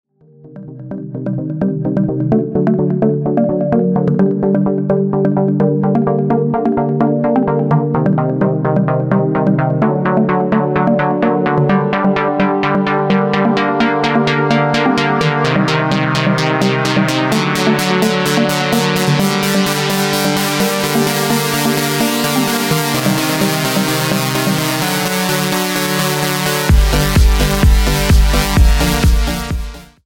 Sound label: Marimba remix